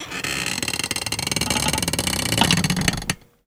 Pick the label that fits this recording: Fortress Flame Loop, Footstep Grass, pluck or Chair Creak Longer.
Chair Creak Longer